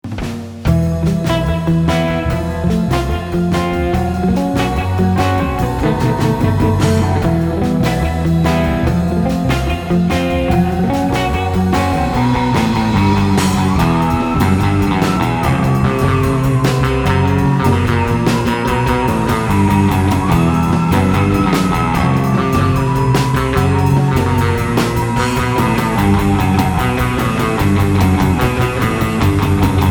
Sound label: shuffle